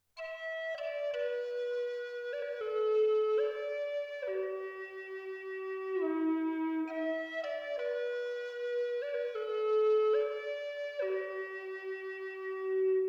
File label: Eastern Red Cedar E 432 Hz